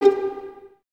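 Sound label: violins G4 pizzicato, String Ensembles; Miroslav Vitous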